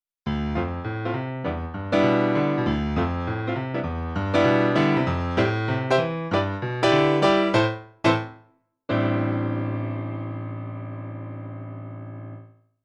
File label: CA95 Studio Grand